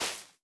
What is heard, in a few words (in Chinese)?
大型垂滴叶踩踏声